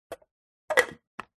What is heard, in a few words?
coffee machine nespresso plastic milk frother lid on